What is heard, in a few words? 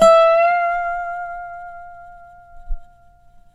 SLIDE, Dobro Slides